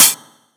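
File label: acoustic closed hi hat; acoustic drums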